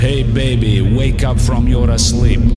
Electronik Notifier

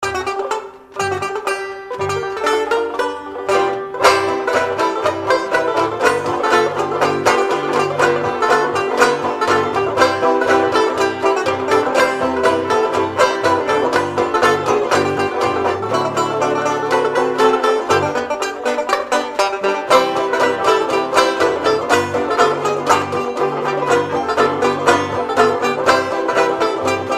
banjo

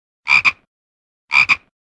Classic Frog

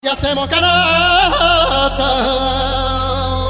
Message Tone

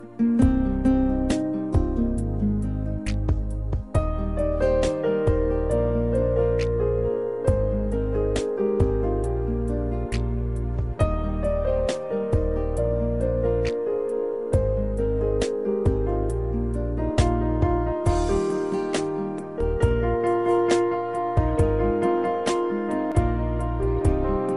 INSTRUMENTALS

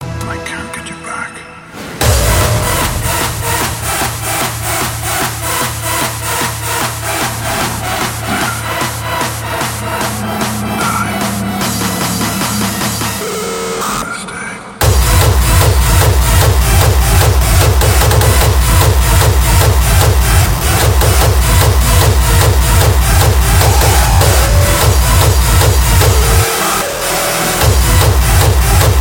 Hardstyle

